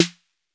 DRM 808 SN, Drum Machine; Roland L-CD701; Cheese menus

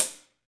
HH HH, HH Real

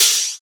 Open HH, Cymbals

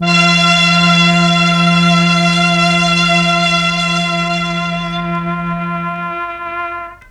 MEL STR, Legendary M400; ILIO; Propeller Island Legendary M400

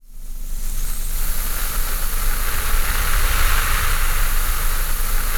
GARBAGE BED, VOCAL WIND; ILIO; Vocal Planet; Jazz & FX

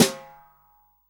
SWING SNARE CHROMATIC, Real Drum Kit; REAL DRUMS

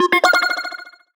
Sci-Fi Lasers - Droid Talk; Antidote Advent

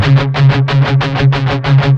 16TH, Guitars; Spectrasonics; Hans Zimmer Guitars VOL-1; MED 16TH

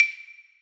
Orch perc; musicradar; cartoon caper

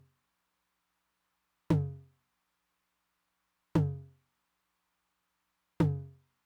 high tom, Roland TR-909; drum machine; Roland TR-909 Ableton Project

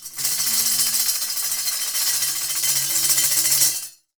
DAF SHAKE; WorldSounds

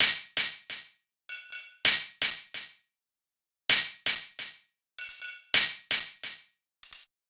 Tm-Fx Loop 130, Perc Loops 130, Loungin House; Equipped Music

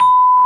VIBE

